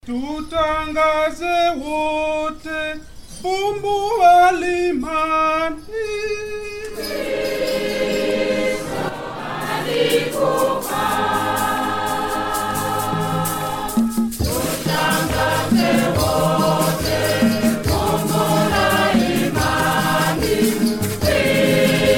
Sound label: Choir; Nebbi Catholic Diocese Choir